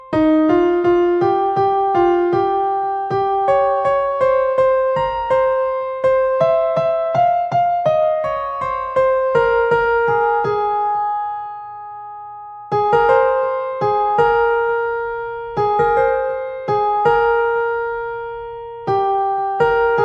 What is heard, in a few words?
Piano Mix